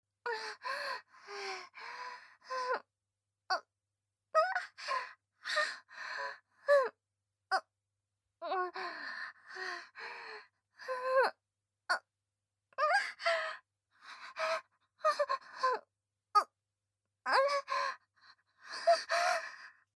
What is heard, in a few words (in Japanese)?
喘ぎ と 吐息; 【甘々媚びアクメ】性欲旺盛な陸上部の先輩後輩JK、ベロチュー青春溺愛えっちでコーチの汚ち◯ぽ生ハメ特訓♪